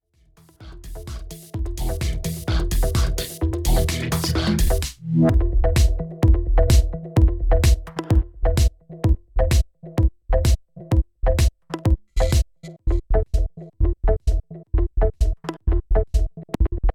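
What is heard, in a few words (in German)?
Denon DJ X1800 Trans Frequenz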